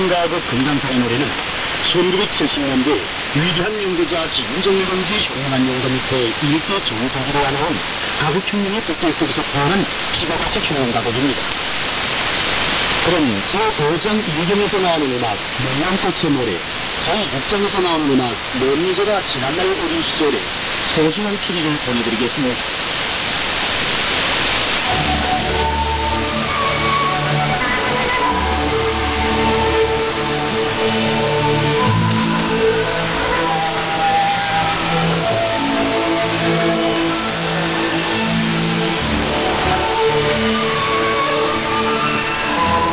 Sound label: 3205.0khz; websdr